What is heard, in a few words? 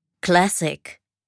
Genres Classic